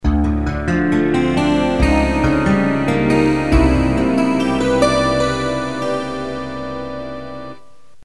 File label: Guitar